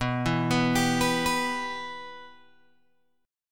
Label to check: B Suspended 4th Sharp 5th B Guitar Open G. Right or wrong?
Right